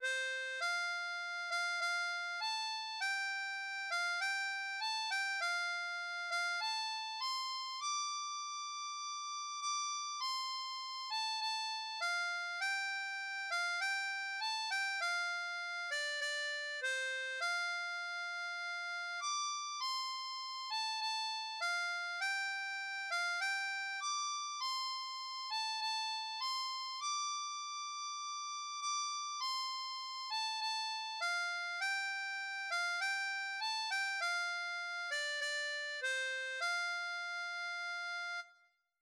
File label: Auld Lang Syne, harmonica; Do